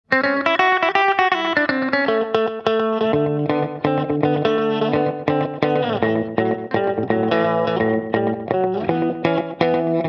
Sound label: tele voicing slapback, fender super champ x